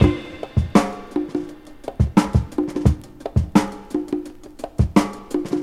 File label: 85 bpm fresh drum beat e key